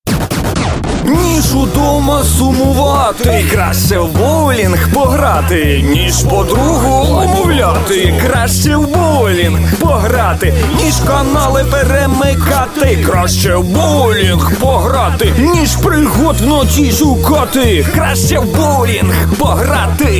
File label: rap compr